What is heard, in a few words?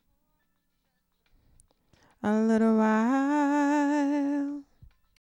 diva